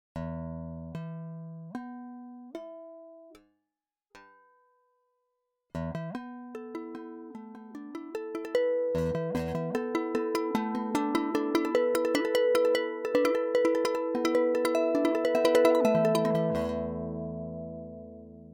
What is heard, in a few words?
harmonics